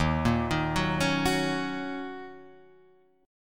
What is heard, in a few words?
Eb Minor 7th Eb Guitar Half Step Down